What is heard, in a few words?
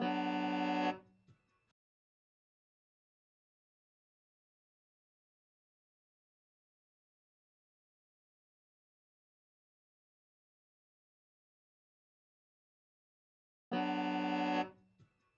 Melodica, dub; ODGPROD